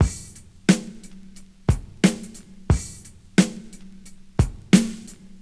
89 bpm drum loop a key

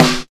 dry snare sound e key

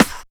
raw steel snare drum sound d key; snare drum samples

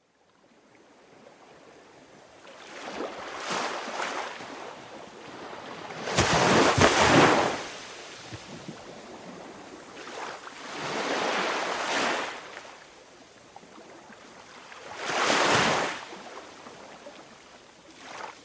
coastal gentle waves; soundscapes

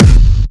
prominent bass drum single hit f key; kick drum samples

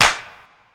rap clap sound b key

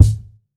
mid heavy kickdrum G sharp key

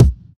thumpy kick drum single hit c sharp key

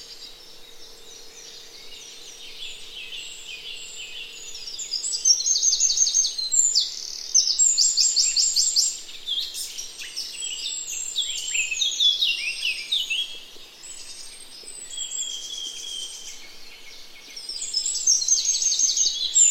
birdsong in woodland

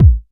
dry kick drum sound a sharp key; kick drum samples

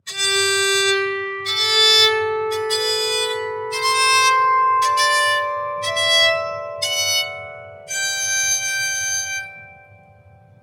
soprano psaltery scale sequence, g, 114; instruments; loops

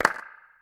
clap sample g key, Loudest frequency: 1606Hz; percussion; single hits one shots

